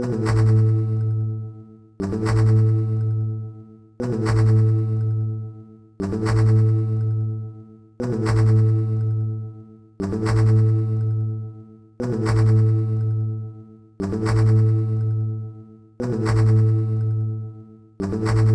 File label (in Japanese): 屋敷内BGM